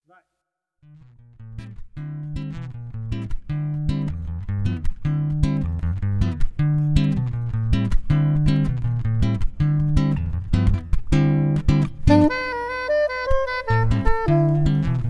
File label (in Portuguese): hino sax